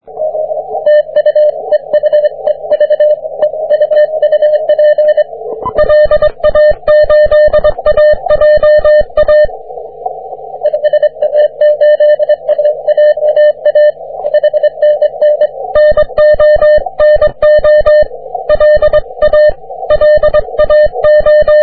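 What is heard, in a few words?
40m continuous wave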